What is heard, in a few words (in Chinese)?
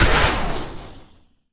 死亡声; 旋风人